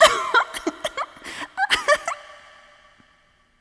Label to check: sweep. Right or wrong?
Wrong